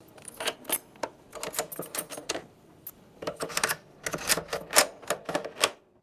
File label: metallic skeleton key inserted and turned; foley